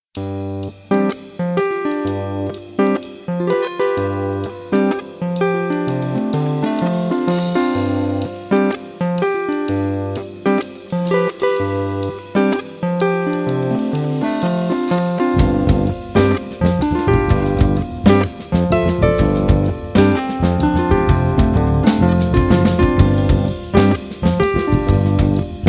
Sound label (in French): gigue hantesque